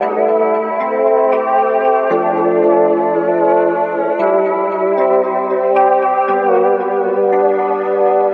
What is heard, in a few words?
115 A Synth Modern Run; Zero-G; Future Wave